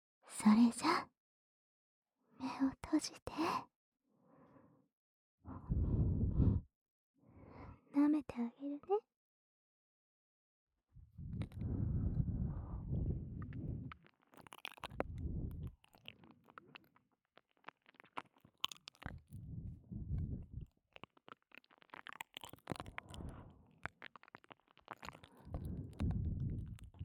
お姉さんの眠れる耳舐め; 効果音同時収録と効果音ロケで"本当に田舎で過ごす"を感じられるASMR生活～田舎でお姉さんに身も心も甘やかされて全部がどうでもよくなるまで気持ちよくなる～

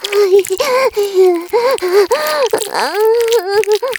絶頂, ASMR; 騎乗位

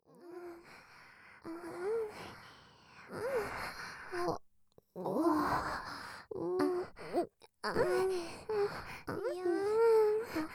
ひたすら嘘あえぎ; メスイキループ; 女の子のオナニーサポート; 双子JKのあまあま乳首オナニー専門店～W密着囁きでメスイキサポートしちゃいます～; KU100バイノーラル

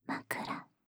まくら, ASMR; 無声音ASMR; シャッフル睡眠ランダム再生用; シャッフル睡眠法で眠ろう; バイノーラル音声

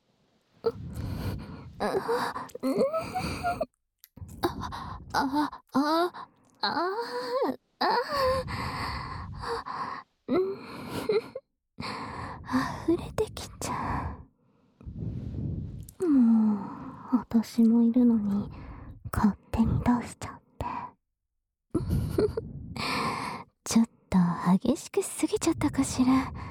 耳舐め生エッチ

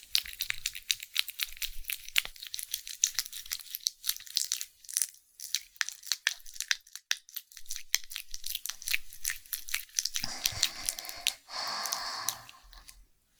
寝くちゅ イキ我慢

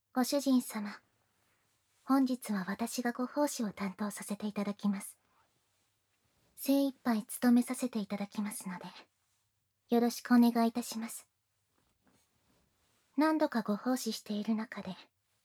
Ｗ添い寝耳舐め言葉責め羞恥ご奉仕

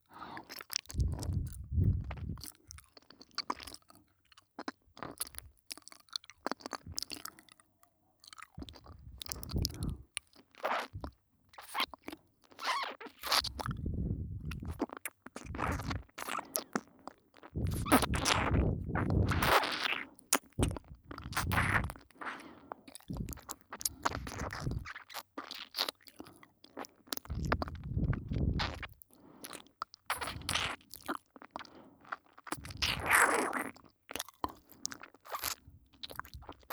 じゅぽじゅぽ耳舐めループトラック, ASMR; 異世界シスターの隠れた品性

